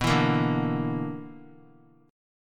B Minor Major 7th Double Flat 5th B, Guitar; strummed; guitar chord

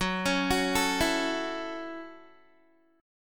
Gb Minor 7th, Guitar; Gbm7; arpeggiated; 14,16,16,14,16,x

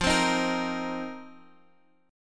F 7th Suspended 4th; F7sus4; x,x,3,5,4,6; strummed

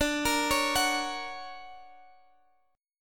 D Augmented Major 7th; arpeggiated; x,x,12,15,14,14; chord

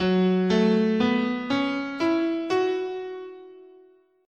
Gb Minor Pentatonic, scale

